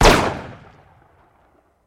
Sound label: Gun Shot Sing Silenc